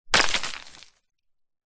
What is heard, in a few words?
foley stone large drop ground light debris